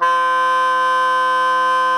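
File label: SOPRANO C 4, Saxophones, SOPRANO GROWL; GRWL; Greytsounds; Philip Wolfe David Crozier's Saxophones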